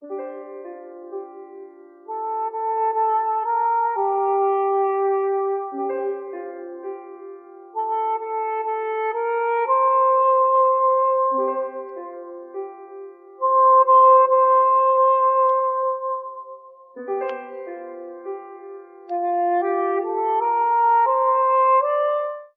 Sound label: piano flute, Loops Sad